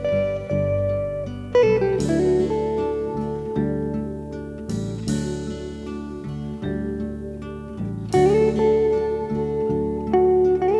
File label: country